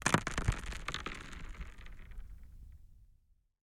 impact stone on frozen lake ice thrown skim contact microphone; tom chapman